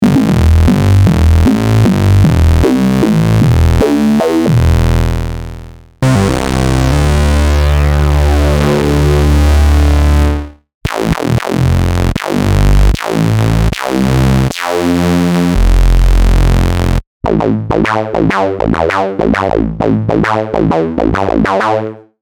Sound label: bass; cherry audio yellowjacket